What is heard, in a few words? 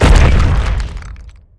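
FOOT L Monster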